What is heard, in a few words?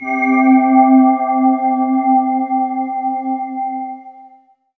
SYN SY GLAS, SYN DIGITAL; Sound & Vision - Gigapack I CD 2